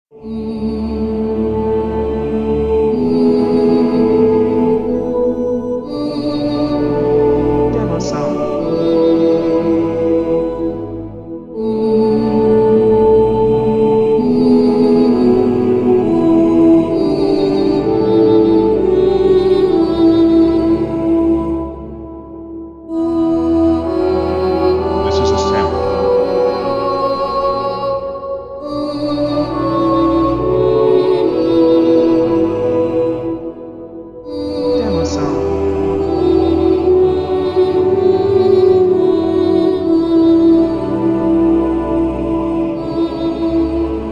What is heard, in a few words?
Holy Sanctuary Heavenly Choir